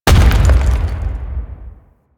Thumper Bot Thumper Impact Close E; Warframe; Grineer

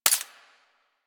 Bladed Pistol Mech; Warframe; Tenno

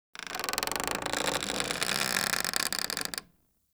Vendor Wood Floor Creak; Soul Plane

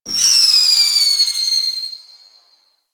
Spam Projectile Whizz; Warframe; Teralyst; Ropalolyst; Abilities

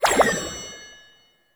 Vendor UI Close; Warframe; Nokko Colony; Vendors

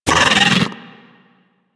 bug vocal pain; Warframe; Hunting Critters; Inf K Drive Bug

